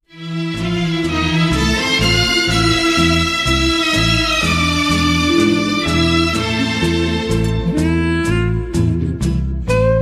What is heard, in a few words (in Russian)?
orkestr, tango